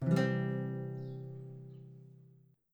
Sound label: string major; abstract; Free Sound Assets